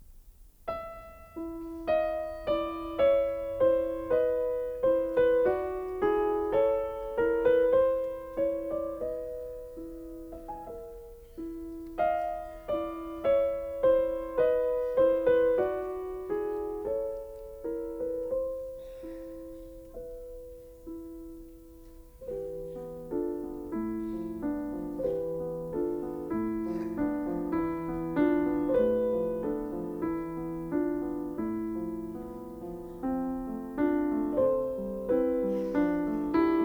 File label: for piano, Dolente; Visions fugitives (20)